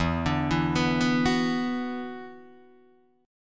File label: E 7th Suspended 2nd, down, Guitar, Open D